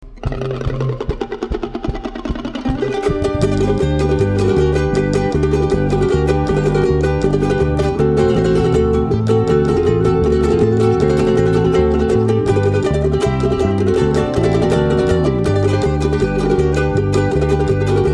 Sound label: ukulele